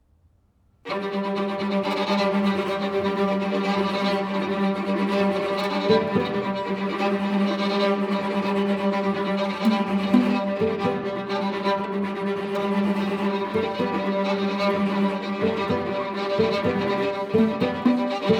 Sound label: String Quartet; 10 Preludes (String Quartet No. 1)